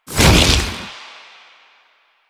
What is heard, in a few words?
Stop, Motorcycle; Motorcycle Ninety Nine